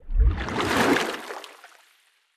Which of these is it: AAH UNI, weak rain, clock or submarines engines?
submarines engines